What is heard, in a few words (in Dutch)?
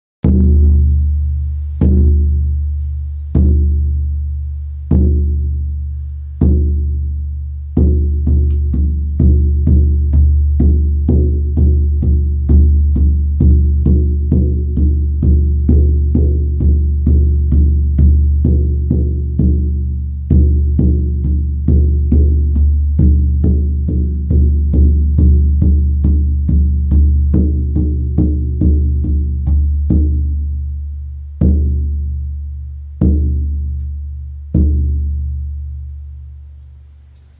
ezel, sjamanendrums